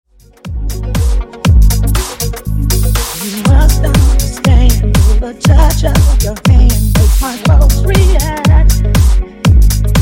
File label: Funk Nu Disco House 120Bpm